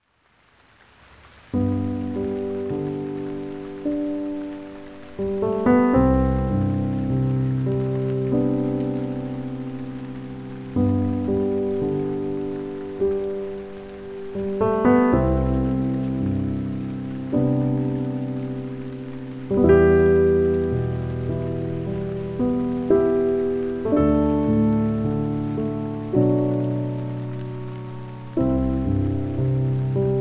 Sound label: Gentle Piano (With Rain)